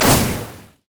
foxfire missile; LoL; ahri

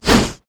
basic attacks; Vi

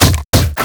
bear basic attack; LoL; annie base

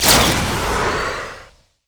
move quick; LoL; super teemo

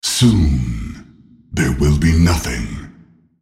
attack; Nasus; Character Voices - English

